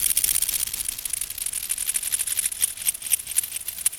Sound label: Halogen Beat, Electronic Beats; Sonic Foundry (Sony Creative Software); Myths of Technology